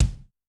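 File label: bass drum Metal, Kick, Yamaha DTXpress-II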